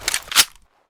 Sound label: usp silencer off, weapons; usp45; garrysmod